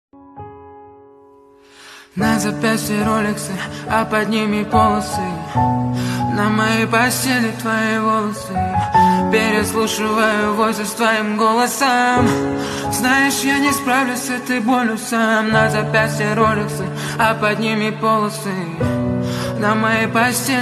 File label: golos cover